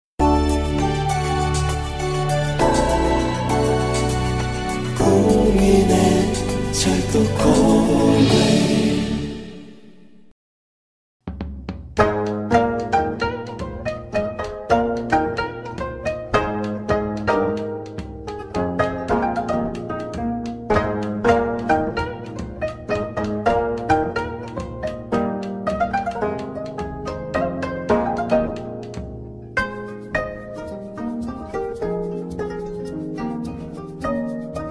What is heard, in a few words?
before approach music, Train Announce